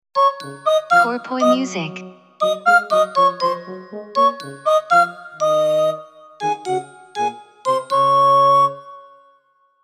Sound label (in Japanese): jingle ウォーター